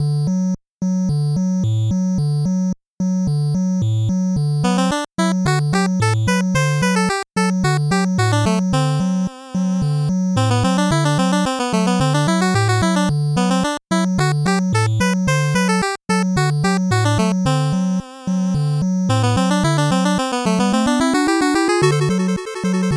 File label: 8bit bgm slow